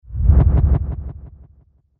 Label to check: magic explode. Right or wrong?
Right